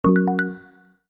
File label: system message; bitrix